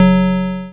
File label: Green Button; MemoryMadness